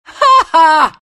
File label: luna laugh; Dota 2